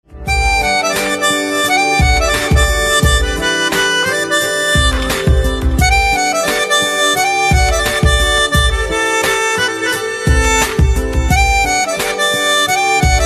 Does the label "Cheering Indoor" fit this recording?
No